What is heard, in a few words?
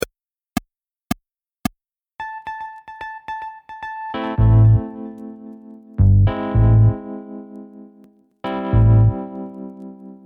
Instrumental minus drums, 170